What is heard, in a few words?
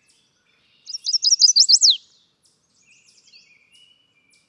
Chestnut-sided warbler; Active: Spring; Passer eminibey